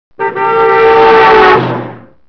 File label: doppler car